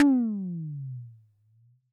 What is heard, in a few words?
TD-20 Tom B, Roland TD-20 Toms